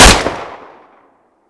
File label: fiveseven; cstrike; ZP CRISTIAN; ZPB WEAPONS